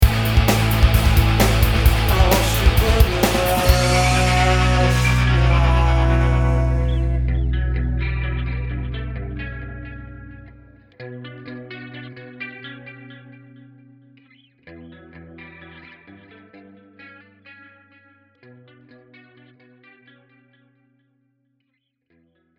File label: tweaked ending